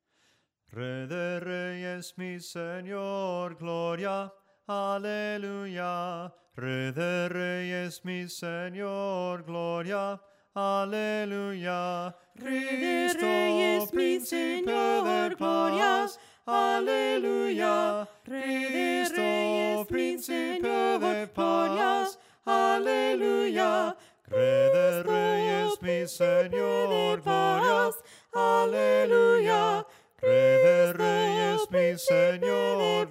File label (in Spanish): SOPRANO CANTADO; REY DE REYES